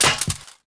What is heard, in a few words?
droid fall